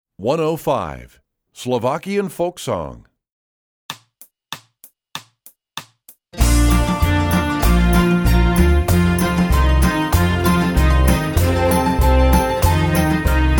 Slovakian Folk Song, Strings; EE2000 Book2

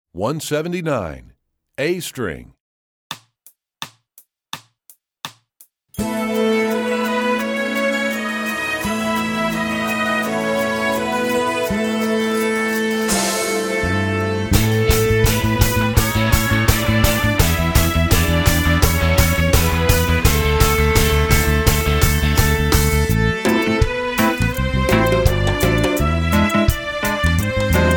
A String, Strings; EE2000 Book2

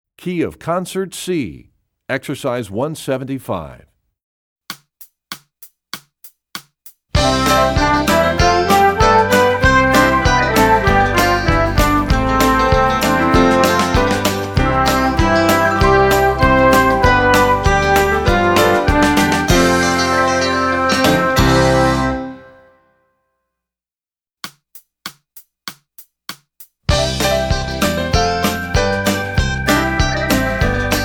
Studies Concert C; EE2000 Book2; Rubank

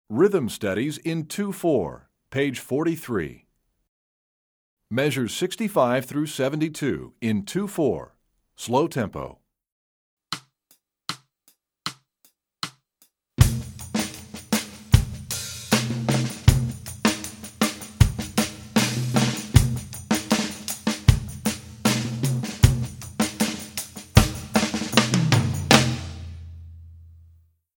Slow Two Four; EE2000 Book1

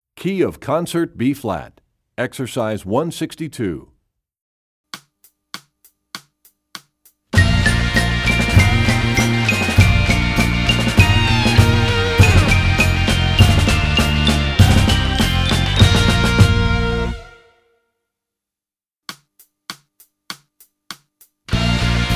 Studies Concert Bb, Percussion; Rubank; EE2000 Book2

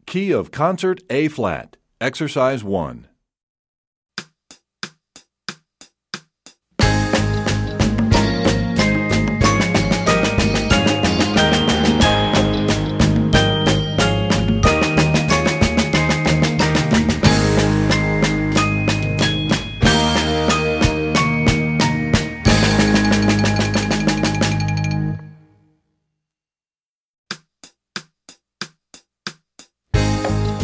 Concert A flat, Percussion; EE2000 Book1

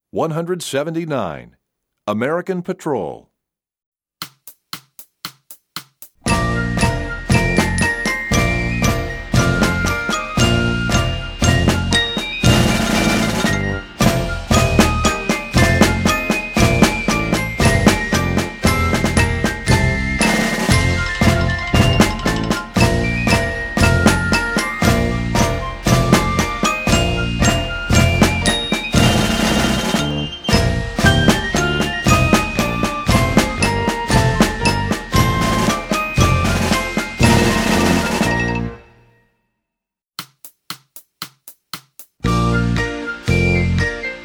Percussion; American Patrol; EE2000 Book1

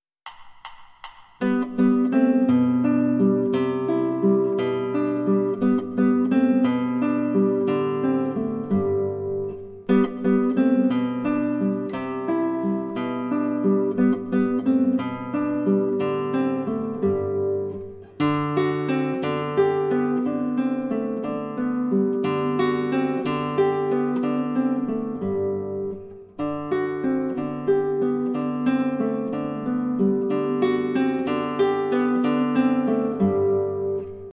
classical guitar

